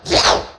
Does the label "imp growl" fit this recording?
Yes